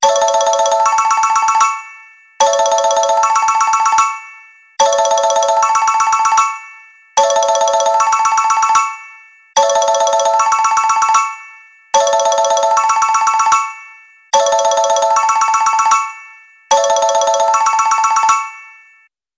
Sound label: Xylophone; Optimus Vu F100S; ringtones; LG